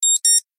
Alarm Beep; LG Optimus Hub E510; alarms

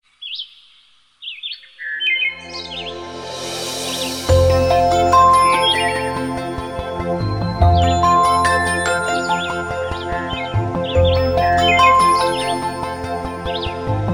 Alarm dream; Hisense E602M; alarms

